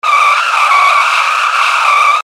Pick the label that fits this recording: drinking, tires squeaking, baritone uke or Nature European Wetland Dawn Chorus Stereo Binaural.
tires squeaking